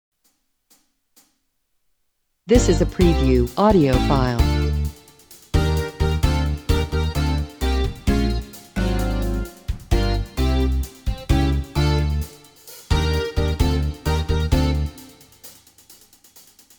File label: Backing Track